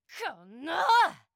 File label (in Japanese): 攻撃